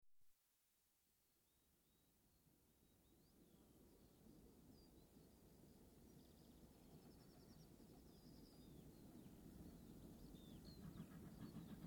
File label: Steam Train approaches from left, coasts past with whistle; BBC